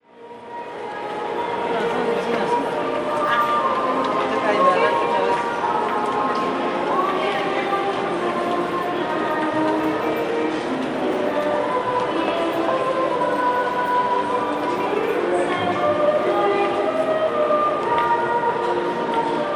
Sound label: Quicentro Shopping In Christmas